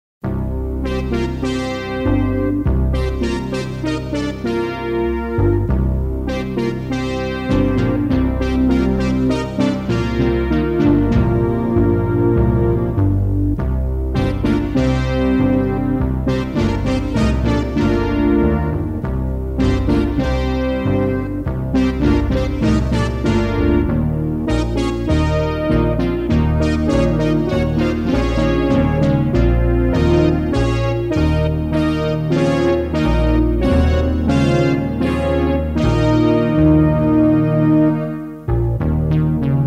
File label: Fanfare